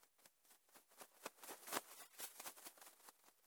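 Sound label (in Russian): large butterfly flew slowly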